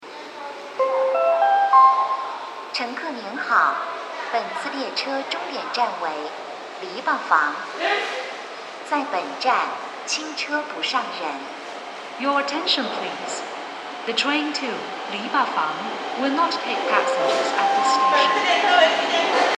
房山线 篱笆房 清车

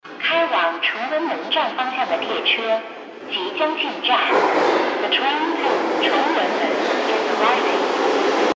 2号线-崇文门方向进站

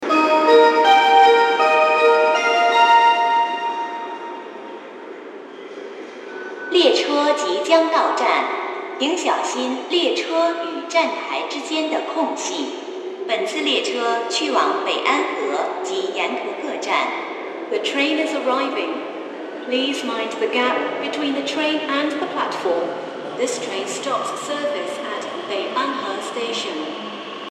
16号线 北安河方向 进站

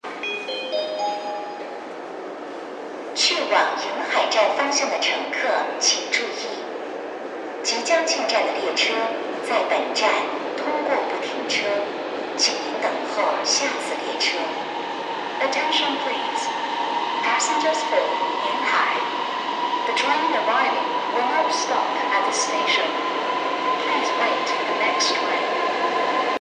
8号线-瀛海方向通过不停车

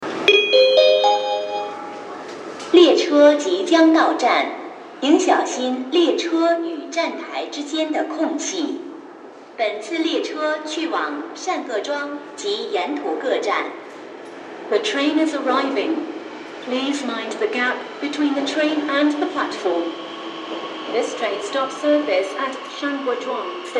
14号线 善各庄方向 进站

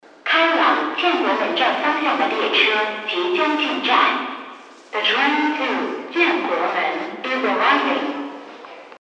2号线-建国门方向进站

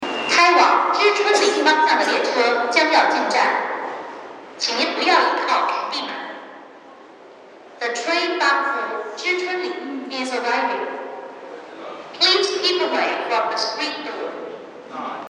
10号线知春路方向进站

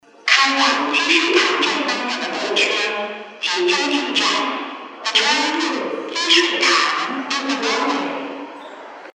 进站; 2号线-积水潭方向

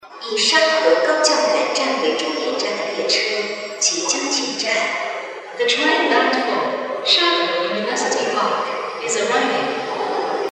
昌平线 沙河高教园 终点 进站

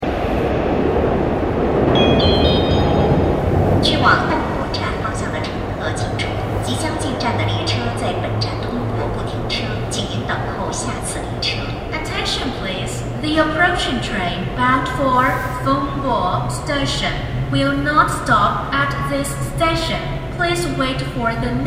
15号线 俸伯方向 通过不停车

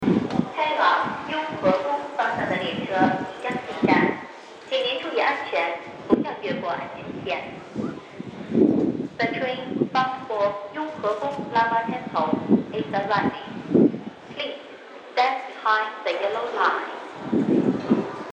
进站; 2号线雍和宫方向

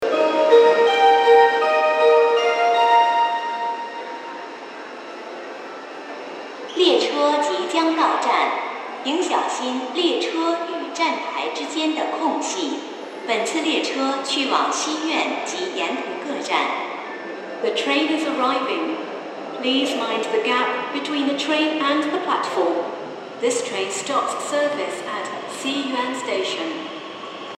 进站; 16号线西苑方向

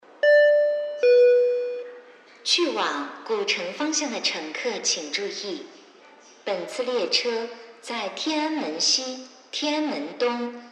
快车; 1号线-古城方向

